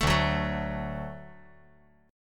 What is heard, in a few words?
D Major D down